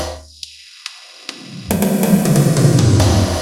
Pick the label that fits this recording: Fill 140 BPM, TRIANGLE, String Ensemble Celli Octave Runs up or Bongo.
Fill 140 BPM